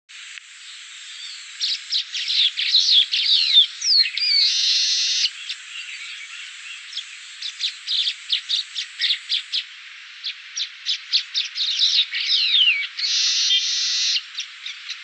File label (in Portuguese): Canto do tecelao baya